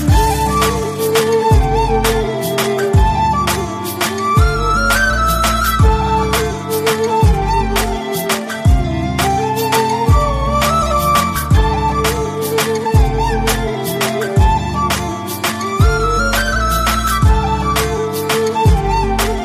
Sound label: instrumental flute